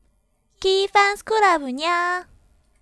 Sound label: vocal logo; KFC同人声乐作品; 歌姬作品